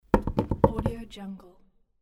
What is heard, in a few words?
finger drumming